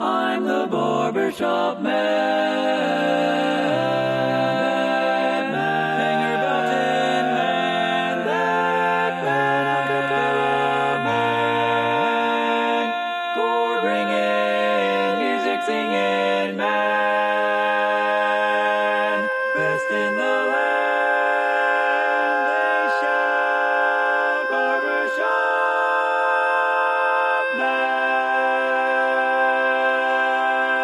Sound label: Tenor, barbershop; TTBB version